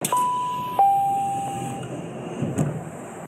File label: Door Open